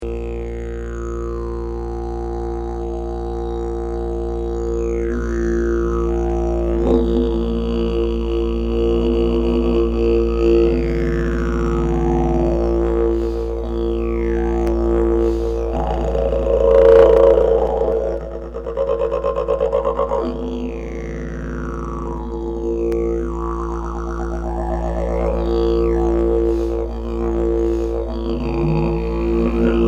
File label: didge